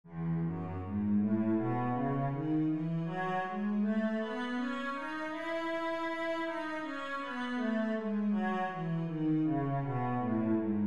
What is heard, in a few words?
cello, e major